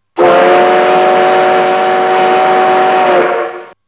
Stoomtrein; vervoergeluiden

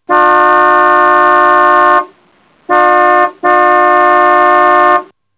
Klaxon; vervoergeluiden

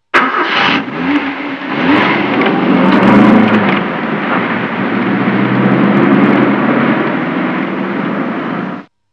Auto; vervoergeluiden

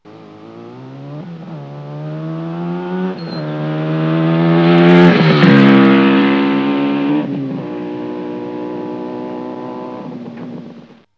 Motor, vervoergeluiden

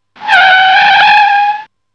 Remmen; vervoergeluiden

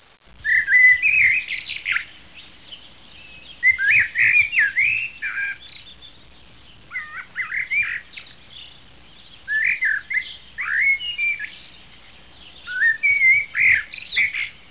Vogel, Dieren geluiden

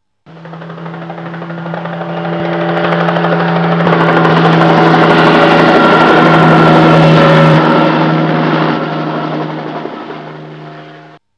Helikopter, vervoergeluiden

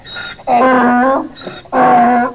Ezel, Dieren geluiden

